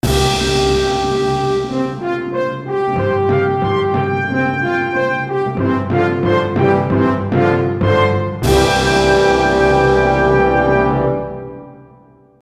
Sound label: VS Battle Fanfare; galeofdarkness